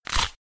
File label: crunch